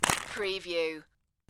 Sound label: Golf Flag Drop